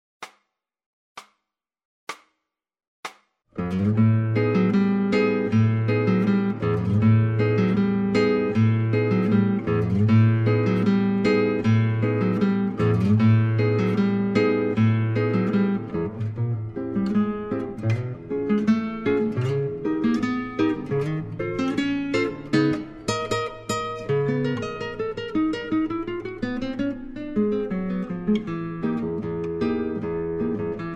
guitar

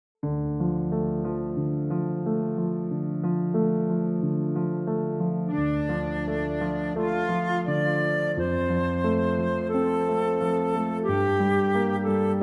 play flute reference; Kung fu panda

